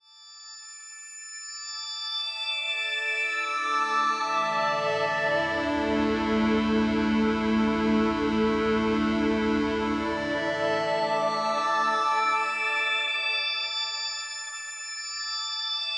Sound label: Chord Ramp A; Martian Chess Audio